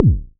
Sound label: modular pitched, kick; Ableton Foundation FreePacks